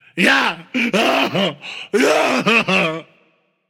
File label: boo hoo; smite; olorun